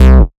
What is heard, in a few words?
Bass C, Offbeat Bass